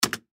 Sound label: Pc Keyboard Press Space Bar Computer; Offices; Technology; Typing